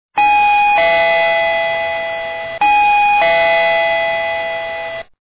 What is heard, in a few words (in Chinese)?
叮咚2声